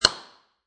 Hammer Nail, Industry, Hammer, Hammering Nail Into Wood; Hammer Sound Effects